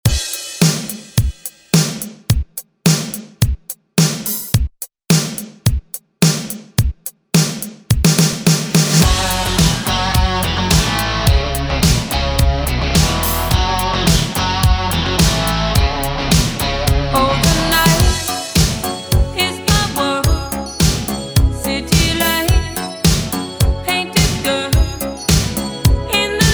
107 bpm

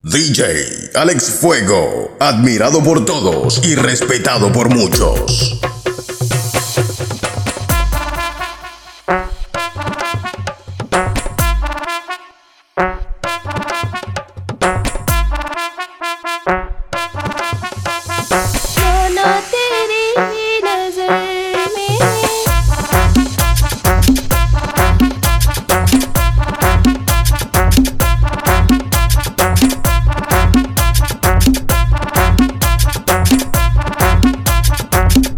130 BPM, arabe bootleg mix

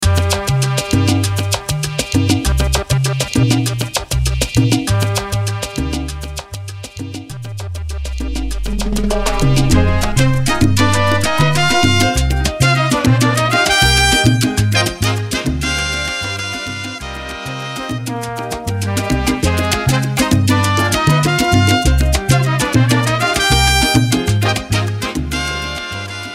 Intro Outro 99 BPM

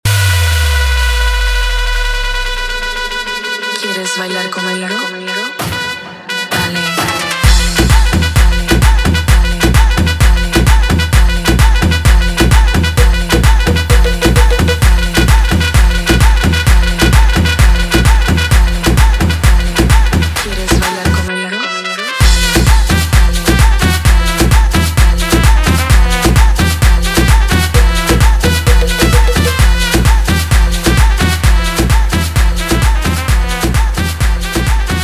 guaracha remix 130 bpm

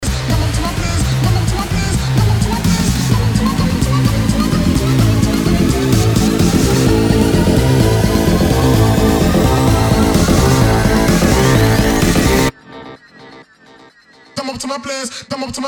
Latin Original Mix bpm 128; Prodeejay Remix

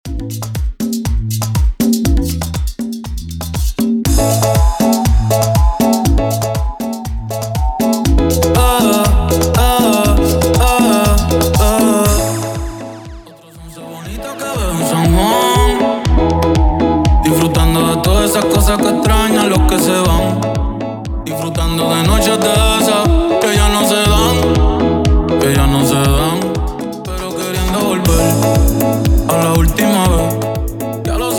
Mambo Remix Intro Outro 120 BPM

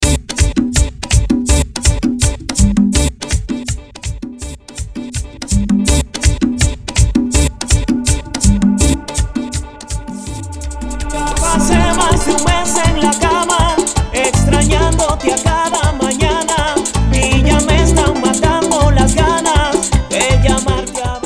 Intro Outro 164 BPM

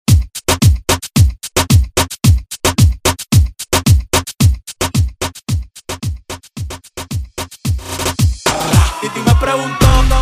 Intro Break 111 BPM

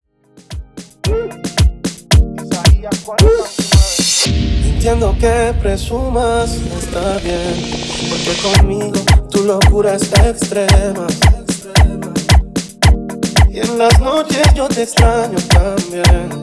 Remix Intro Outro 112 Bpm

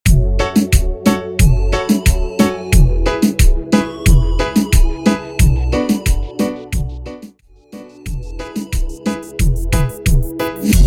Reggaeton To Trap 90 to 130BPM; transition reggaeton to trap

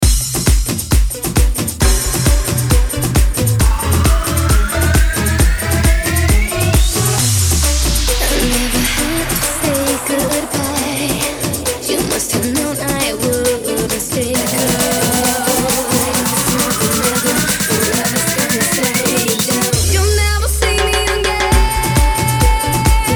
Guaracha Bootleg Remix 134 BPM

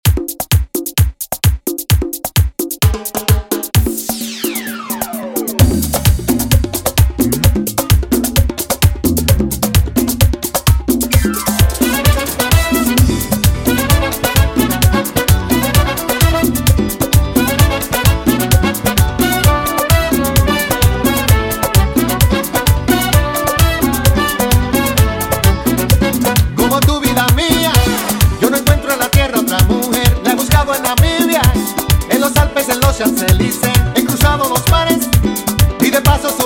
intro outro 130bpm

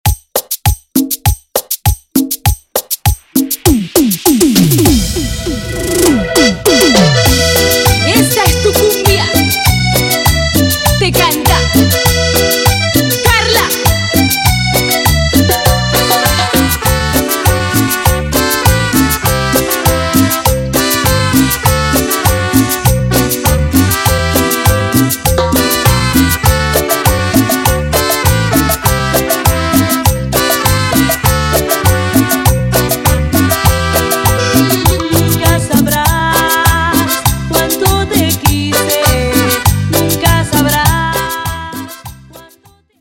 intro outro 100bpm

